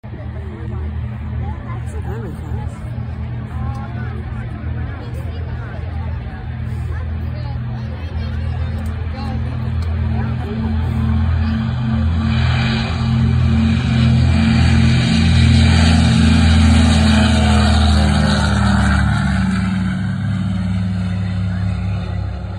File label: Douglas DC-3; هواپیما داگلاس دی سی تری